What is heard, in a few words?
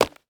Sports Baseball Catch Leather Mitt